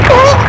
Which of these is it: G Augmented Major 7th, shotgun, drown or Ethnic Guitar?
drown